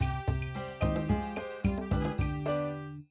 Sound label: Salsa Horns, ringtone